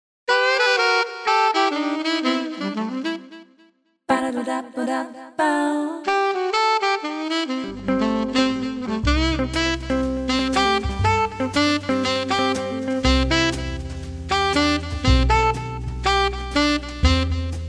Short Stop Sax Swing

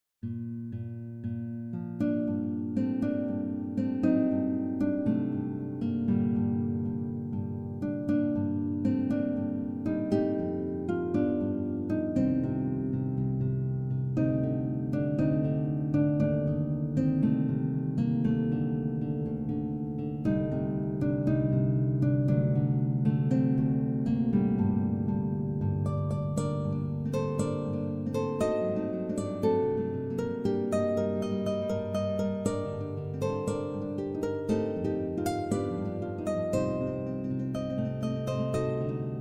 slow melodic guitar

